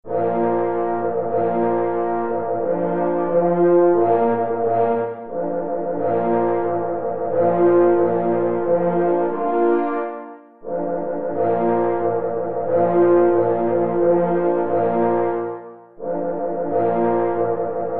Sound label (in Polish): Marsz na 3 parforsy; J. Rentflejsz; Marsz jubileuszowy